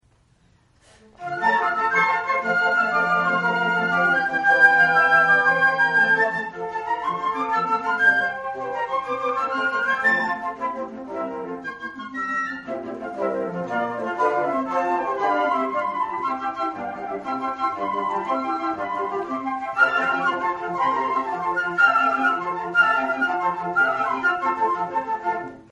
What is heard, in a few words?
new year consert, flute